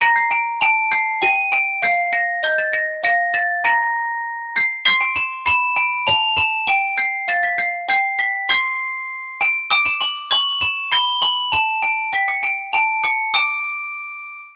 Toy Piano; Sony Ericsson; K750i

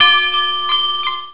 Clock Shop, Cisco Unified IP Phone 7945G

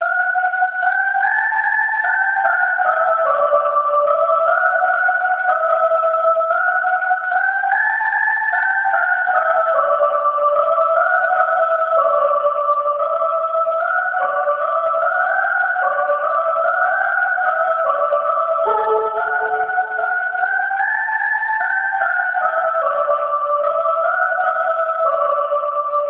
Chorus; Audiovox; CDM-8940

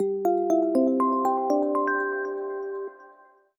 Power On, LG K83-5G ui

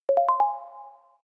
circle unlock, phonetones, ui; LG Optimus G F180K

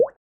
Drop; OnePlus OxygenOS 13; dynamic notifications

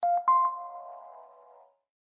TaDa; BLU G60

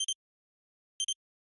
selftimer; Fujitsu; Arrows-NX-F-01F

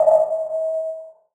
findme alarm; Apple iOS 4

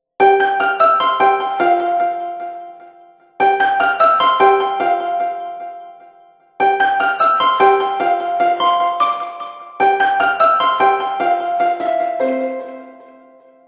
Ring Sizzle Harp Solo; Microsoft; Windows Mobile ringtones; Individual download-only (2008)

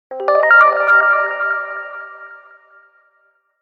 jazz, phonetones; Sony Ericsson W350i

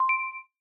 light unlock; LG Optimus G Pro F240K Lollipop

